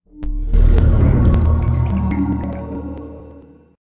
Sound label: bpm intro; SteamBugs; steam-for-linux